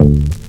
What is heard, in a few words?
BASS LONG D; E-MU Producer Series Vol. 8 – Platinum Phatt; MoPhattInstrmts